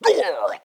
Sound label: Boss Zombie Die; ZombieBattle; audio zombie